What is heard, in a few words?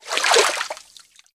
Water Turn